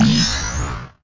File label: powerup speed; Golf Blitz 1.12